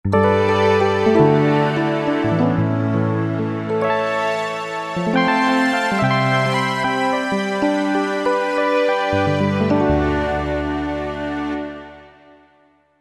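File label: Mark1 & Strings; Korg MSC-8S Percussion; Mark1&Strg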